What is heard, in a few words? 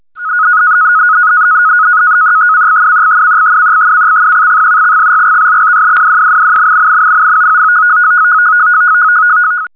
FEC CQ, digital modes; hamradio; Digimodes